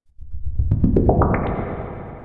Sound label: bloo